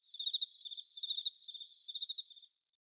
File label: Forest Open Glade Cricket Close; Day